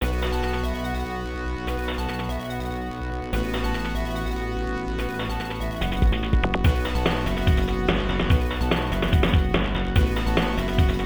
Bamboo Forest; Kingdom Grandprix; Toaplan 2; arcade

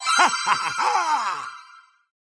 Achievement Earned; Adventure Time Game Wizard Draw Your Own Adventure

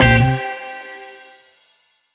sting; minecraft story mode gamerip 2016; Order of the Stone; AutoChickenMachine

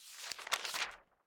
Page Turn; the 39 steps

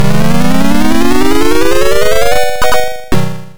Level End; prehistoric tale a; atari st

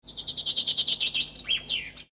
Env Afternoon Bird; Joan of Ark

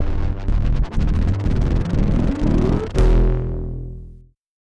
Power Up Health Pickup

